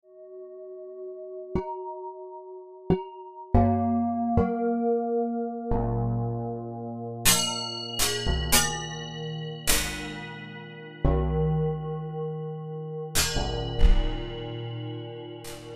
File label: group of gongs